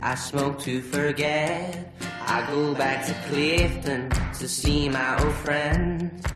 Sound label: bugg rhotic, singing; two directions